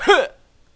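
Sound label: Jump, male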